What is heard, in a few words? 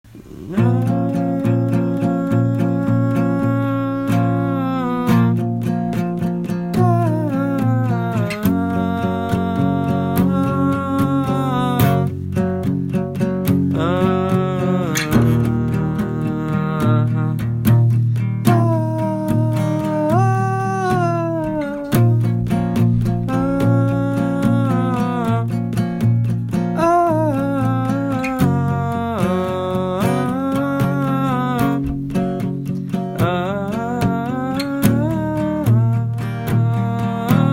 Waltz with death choir; Dark cabaret meets musical theatre meets rock